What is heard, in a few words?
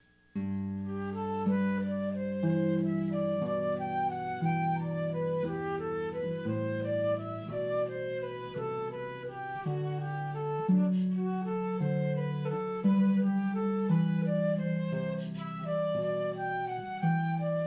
Harp & Flute; Bach- Jesu, Joy of Man's Desiring